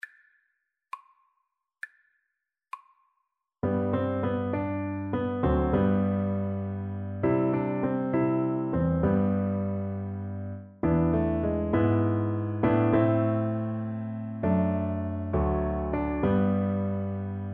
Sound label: Cello